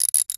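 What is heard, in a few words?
Ratchet; 1001 Sound Effects; Tools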